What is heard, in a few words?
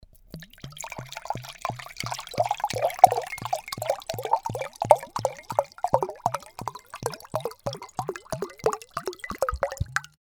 bottle fill glass